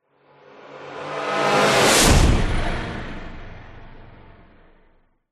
science fiction car vehicle drive zoom past engine rev sonic boom pass